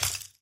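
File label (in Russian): сбор стеклянных осколков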